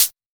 Closed Hihats; Vengeance - Essential Clubsounds Vol.5; Cymbals

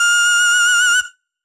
Lead - F

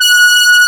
BRASSY; East West; Global Sounds

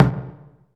Mix Percussion

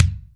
Kick, Akai MPC-500; Toolkits; Kick TKit

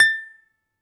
BALALAIKA, Prima Balalaika, Plucked Strings

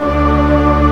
Organ, Barton Morton Full

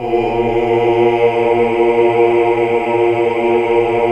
AAH UNI

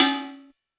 Drums, Kawai K4, Drum Machines

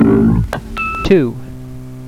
MORE NOISE, HITS; Kid Nepro - Elite

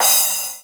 CRASH, Drums Dance & Groove

